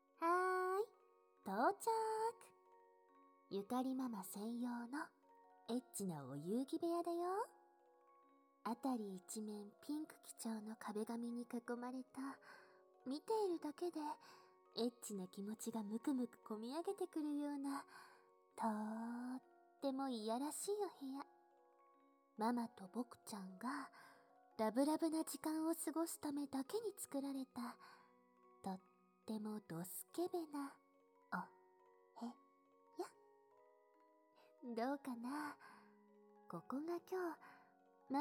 キス 耳舐め; キスでメロメロ&耳舐め授乳手コキ(初来園ver)

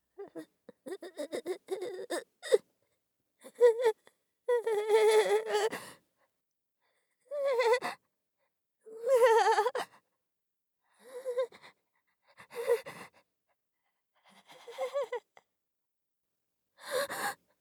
怖くて反応取れない; 効果音なし

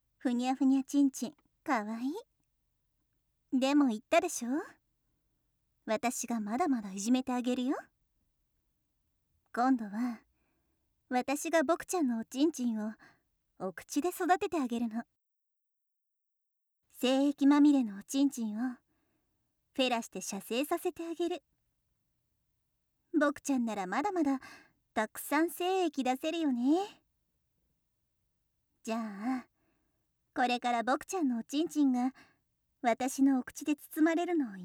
疑似フェラ

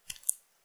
ゆっくりめ, ぱちゅ音単発

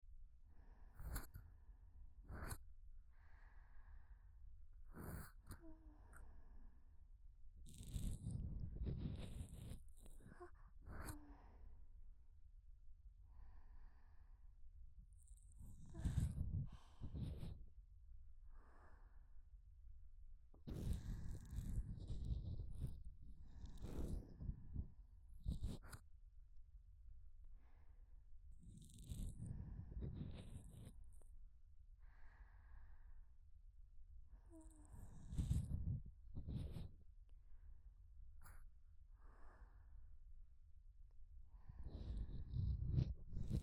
耳かきループ左

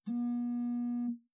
ヴーン, 効果音

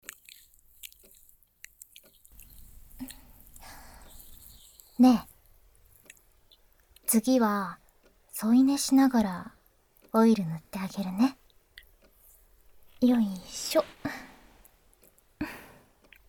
吐息と、交わるオイル（右）

